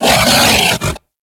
Kubrow Feral Vocal Melee Attack; Enemies; Warframe

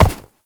Step Snow; Warframe; Uriel; Movement

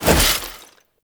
Heavy Stomp Start; Abilities; Warframe; Tau; Dax Heavy

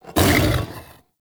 Melee Grunt; Enemies; Vocals; Warframe; TheNewWar; Archon; Amar

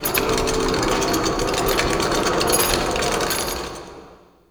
Wisp Door Loop; Ambience; Props; Warframe; Tau; Circles Of Hell

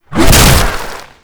Small Arm Swing, Proto Infested Ancient; Warframe; Abilities

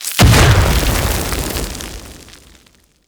Sandblast Impact; Warframe; Mummy

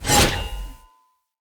Cleaver Throw, Swords; Weapons; Player; Bromius Cleaver

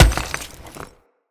Great Sword Melee Impact Stone; Weapon Impacts; Player; Swords; Environment; Founders Great Sword

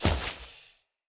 Idol Projectile Default, Weapon Impacts; Idols

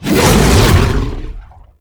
Unholy Punch Leap; Roathe; Warframe; Tau; Abilities

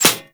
Arrow Impact Metal; Warframe; Weapons; Bullet Impacts

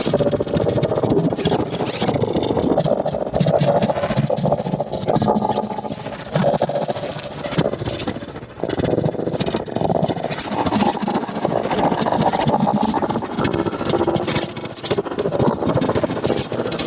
Pulse Projectile Loop; Warframe; Gyre

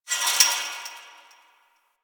Fence Rattle One Off; Warframe; Ambience; Nineteen Ninety Nine

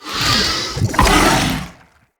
Attack Heavy, Vocals; Enemy; Characters; Corrupted Sproutfolk

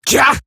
pain, female; Warframe; Eximus Grineer Female; Battle Barks

